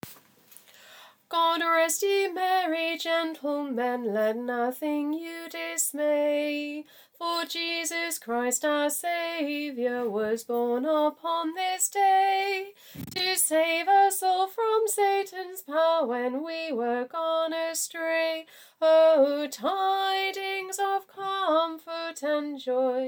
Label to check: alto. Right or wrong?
Right